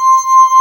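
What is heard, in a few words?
analog pipe C4, analog synth; AKAI S6000 CD-ROM - Volume 1; SAMPLE WAVE MIXING 1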